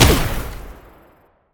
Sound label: weapon; Opticurse